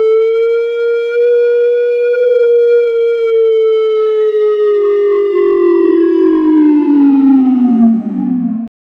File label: world fall apart alarm; apocalypse